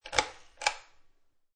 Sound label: Light Switch Chain On Off, Household, Light Switch, Overhead Light, Chain Pull Down And Release